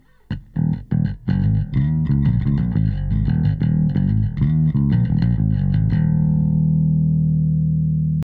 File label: Limit bass; SSL X